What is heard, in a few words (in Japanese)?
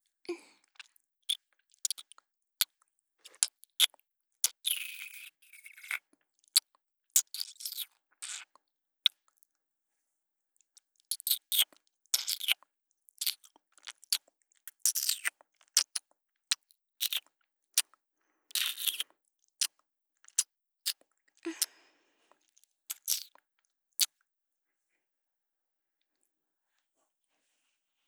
キス音, ASMR